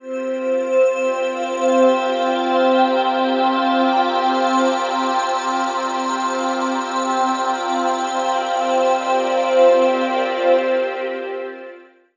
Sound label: Glisten C, Atmospheres; Zero-G Ambiosis